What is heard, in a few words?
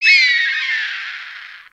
Howl, creatures pets